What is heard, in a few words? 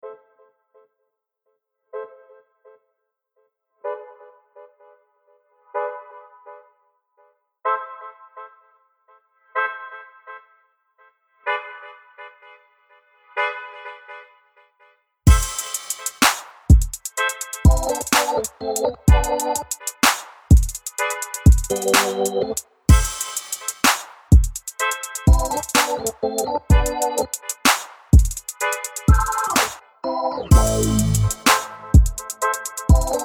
Carnivale Big Beat